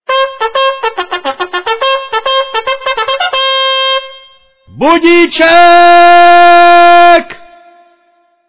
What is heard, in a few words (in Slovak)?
budík